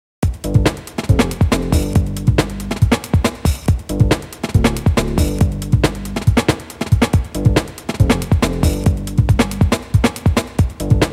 Fast drum era; lolicore

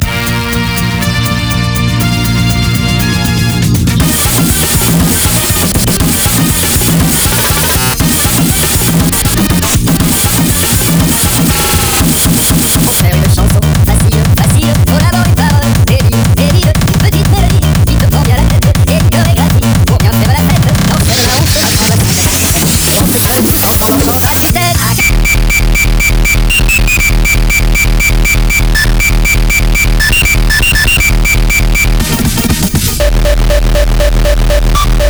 KICK; KICK AIGU 2

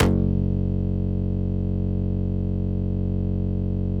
Bass; Nox; Synthetic's Serum Collection; HYPERBITS

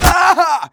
death, player; Team Fortress 2

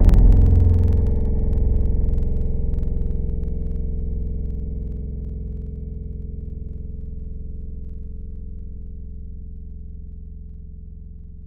Banjo; Synthetic's Serum Collection; Echo Sound Works Sphere

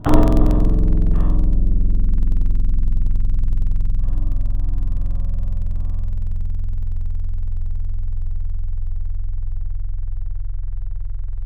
Acoustic Bright Mid; Synthetic's Serum Collection; Surge Sounds - The Sky - Serum Wavetables

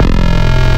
talking digits; Wavetables; Synth One Shots; Synthetic's Serum Collection; ESW Core Wavetables; Echo Sound Works Core Tables

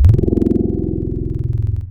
wah wah; Vowel; Synthetic's Serum Collection

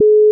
busy 425Hz 330ms; Windows 10x

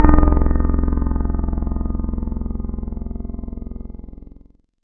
Key Piano Like; Instruments; Synthetic's Serum Collection; Echo Sound Works Core Tables

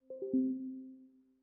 Windows Notify Messaging

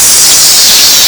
Chinese Cartoon; lolicore

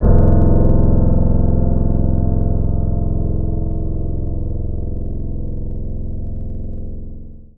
Keys Acou Piano; Synthetic's Serum Collection; ESW X Keys

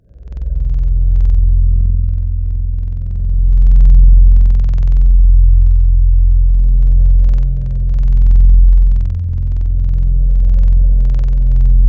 Piccolo, Wavetables; Synthetic's Serum Collection; Standalone-Music SYMPHONY Wavetables

